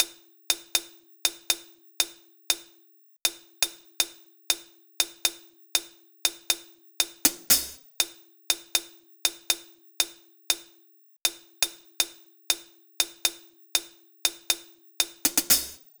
Hi Hat; Sonic Foundry; Sony Creative Software; Hydroponic Hip-Hop; Beat Components; Hi Hat Cymbal Patterns

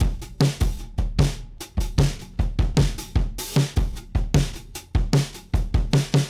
Dirty Basement, drums loops; BASEMENT DRUMS VOL.1

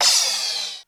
Crash, Korg DSS-1 Drums

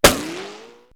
On The Head, perc fx; X-Static Goldmine

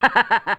Laughing Man, Yamaha DD-35

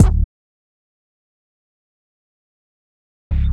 Electric Bass

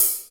HH, REAL

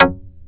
TURBO ACID

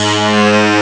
bass string; Amiga Soundtracker Sample Packs

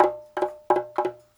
130 BONGO1; Drumdrops In Dub VOL-1; PERC LOOPS; 130-140 BPM

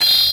MET SW SIN, SWORDSING

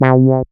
SYN MC WAH, Roland MC-202

